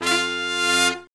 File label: BRS SHRT, R&R Horns, Sect; Roland L-CDX-03